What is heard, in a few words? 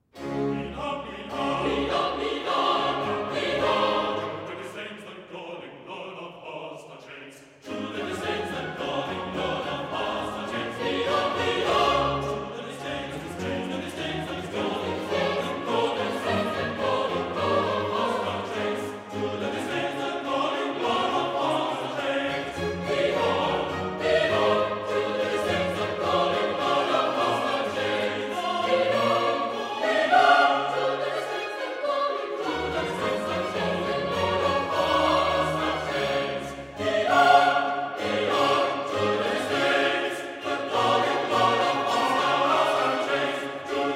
Chorus Israelites; George Frederick Handel; Judas Maccabaeus 1746; Act 1; Lead on. lead on! Judah disdains